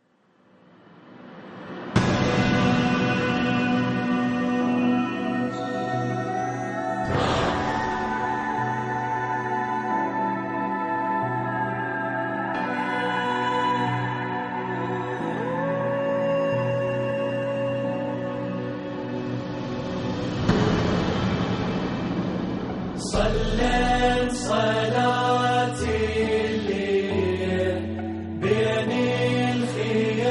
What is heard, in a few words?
NAWHA ARABIC